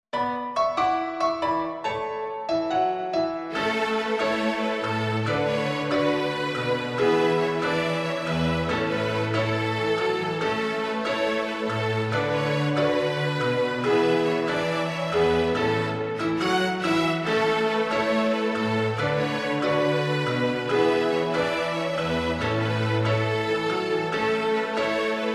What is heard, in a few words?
String Street Calypso